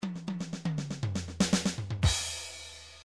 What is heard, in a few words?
midi drums drums fill; Midi Drums Drums 1of6 (drums) Fill 8